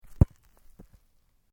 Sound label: Sport Soccer Bounce Grass; Soccer ball sounds